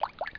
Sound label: plip; TileWorld